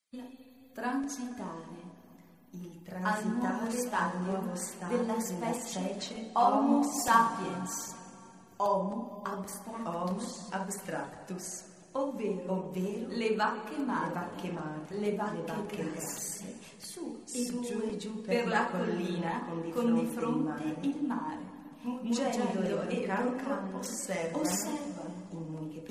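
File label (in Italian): Voce fuori campo, per strumenti vari, acustici ed elettronici con raddoppio per pianoforte e percussioni; Il gesto del nulla nr. 2; di Paolo Ferrari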